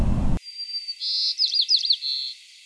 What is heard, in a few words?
song, calls; wcsp #2 song 3